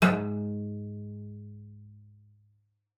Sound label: CELLO FINGER G#2; 90 s Sample CDs; Xsample VOL-5